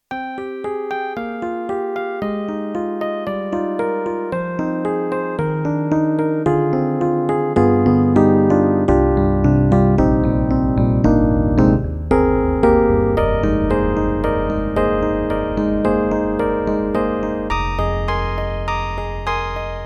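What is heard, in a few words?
YAMAHA MU1000EX, Electric Piano 2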